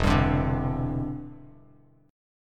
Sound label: E Minor 7th Sharp 5th, down, Bass Standard; strummed